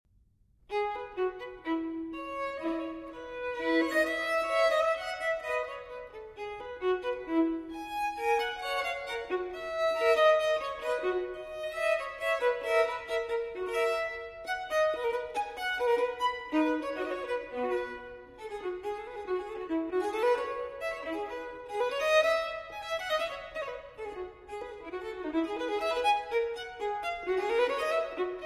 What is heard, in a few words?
Fantasia For Solo Violin in F Major, Un Poco Vivace; Telemann